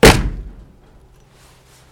Door Slam

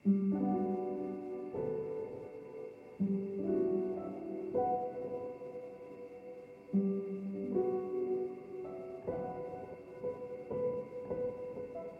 Soft Piano Loop 160bpm

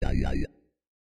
Dubstep

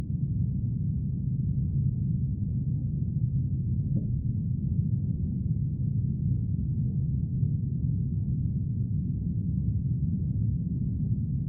Submerged

